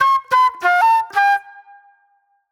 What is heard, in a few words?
J-Funk Flute, Call And Response; Sonic Foundry (Sony Creative Software); Manhattan Flutes